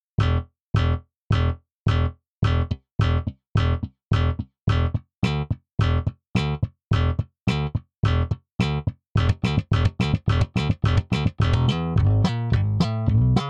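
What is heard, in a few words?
Bass, default setting, slap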